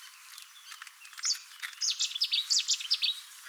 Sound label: cettis, summerleys